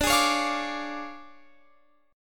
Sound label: D Minor Major 7th Flat 5th, D, Guitar Open F; DmM7b5; strummed